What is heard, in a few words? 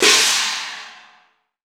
China, ChinesePrc; Akai MPC500